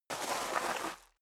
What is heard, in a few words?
step high heels gravel medium slide long; schritte; Footsteps